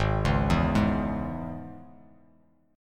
G sharp Suspended 4th Sharp 5th G sharp; G#sus4#5; arpeggiated; JGuitar

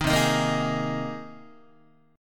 C Minor 7th Sharp 5th C Guitar

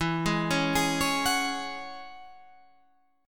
E 6th Add 9th E down Guitar Half Step Down; E6add9

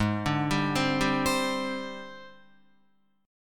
Ab Major 7th Flat 5th Ab, down, Guitar; arpeggiated; JGuitar

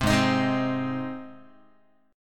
G sharp Major G sharp Guitar, chord; strummed; JGuitar

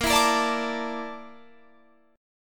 Bb Major 7th Flat 5th Bb Guitar Drop D; 20,19,20,19,22,22

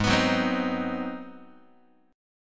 G sharp 7th Sharp 9th, Guitar; G#7#9; strummed; x,4,2,4,4,2; JGuitar

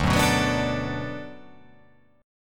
D 6th D down, chord

